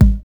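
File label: BD, bass drum; X-Static Goldmine 1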